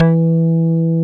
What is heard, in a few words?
PULL BA; Synclavier Sampler Library; KEYS AND GUITARS